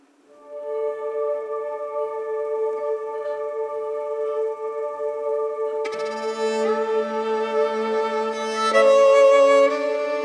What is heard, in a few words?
violin